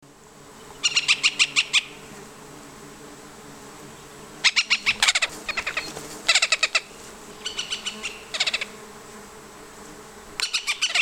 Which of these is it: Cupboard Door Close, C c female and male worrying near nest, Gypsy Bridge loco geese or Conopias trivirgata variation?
C c female and male worrying near nest